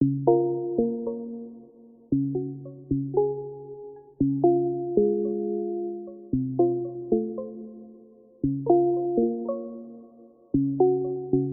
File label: Theme Music Open; Hevn